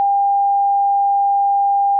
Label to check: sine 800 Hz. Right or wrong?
Right